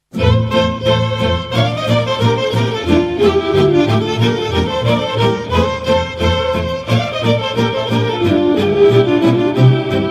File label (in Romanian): HORA DOUA CLARINETE, Roumanie